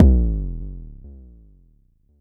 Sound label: Mod bd, Jomox Airbase99